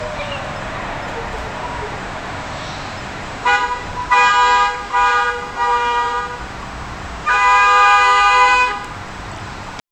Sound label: urban street human voice, car horns